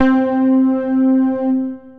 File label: Past Keys, Synth Keys